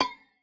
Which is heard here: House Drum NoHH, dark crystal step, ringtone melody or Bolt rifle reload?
dark crystal step